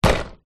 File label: impacts plastic seat hit; zapsplat